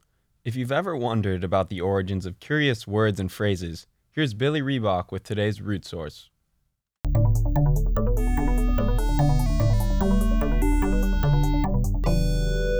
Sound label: JAZZ WITH ENDING